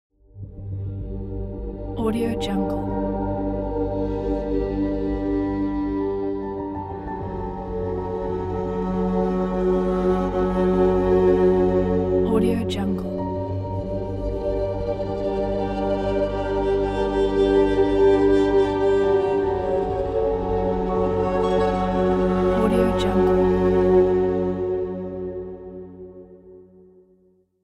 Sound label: ghosts and demons ident